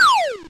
Celebrate

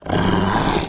Wolf alerted

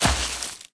Footsteps; unreal development kit

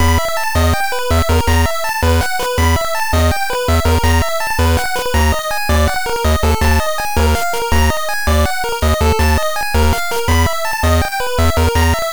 Dungeon; SaGa 3 Jikuu no Hasha; GB

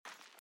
armour Light Run; out of reach treasure royale 2020 gamerip pc

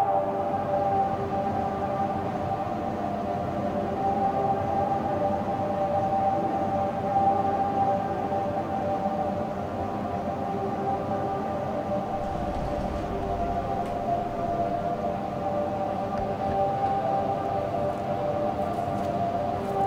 Catacombs ambience; Legend of Grimrock 2

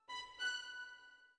orbit violin; Sky Children of the Light gamerip

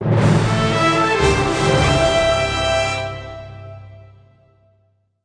Guild Battle Jingle

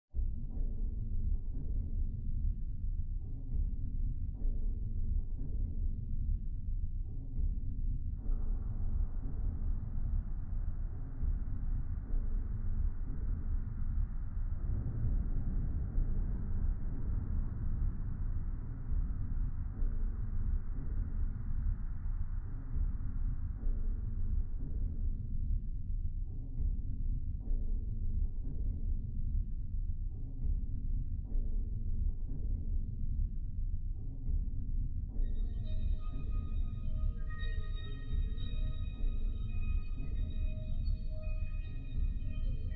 Aqua Ambiance; Experience112

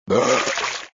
reaction vomit; coffee shop

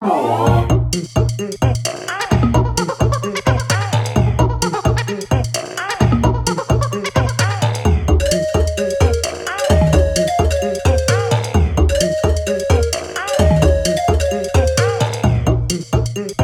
Primal Rave Clear; donkey kong country 2 fan remastered; Diddy

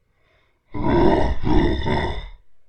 Attack long; gamerip; I Am Weapon: Revival

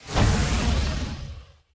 Energy Orb

